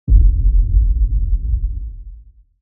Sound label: Deep Hit Reverberant